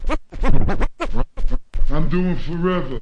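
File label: turntable forever, Scratching and Turntablism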